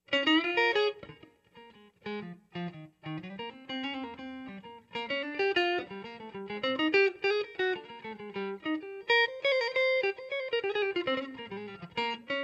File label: Ragin Cajun Blues Strat